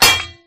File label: beartrap miss; traps